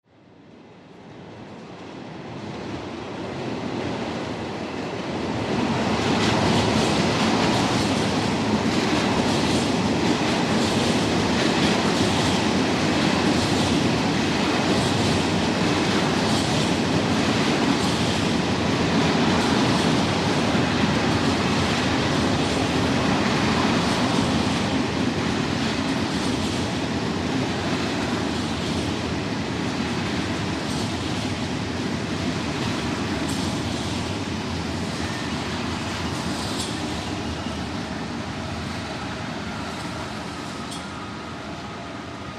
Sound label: Train Arrives Chimes, train station